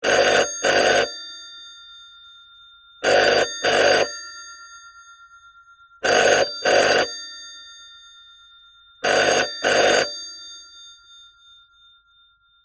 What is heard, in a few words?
siemens h70 australian standard ringing; 1968; Sound Ex Machina